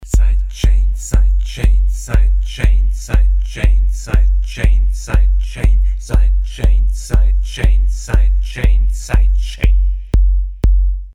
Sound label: sidechain pumping; tc helicon perform ve